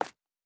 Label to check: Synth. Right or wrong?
Wrong